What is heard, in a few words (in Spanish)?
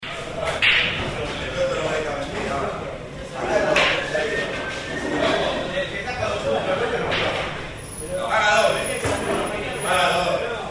bares pool bar; salta y alvear